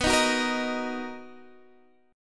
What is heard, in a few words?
D sharp Minor B, Guitar